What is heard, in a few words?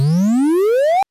firecharge; SMB1 Sounds